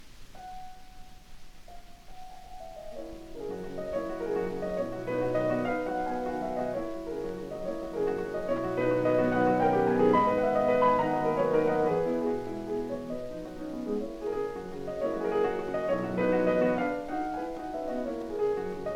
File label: Prelude in B Major